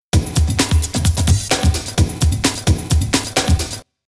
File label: Rhythm Loop